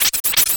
spider walk done; Dystopia; weapons

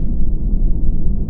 tunnel; Dystopia; fortress

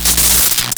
bolt explode, boltgun; weapons; Dystopia

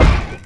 heavy concrete, footsteps; player; heavyfeet; Dystopia

